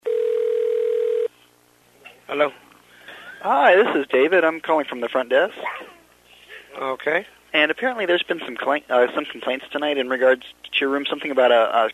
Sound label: hotel noisy baby